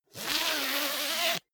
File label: zipper pullover jacket close; objekte; reissverschluss